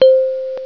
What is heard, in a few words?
celeste; KinderGrid Demo